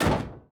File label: robot step; teardown; ps5 windows xbox series xs